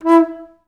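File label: alto flutes staccato E3; Best Service; Peter Siedlaczek's Advanced Orchestra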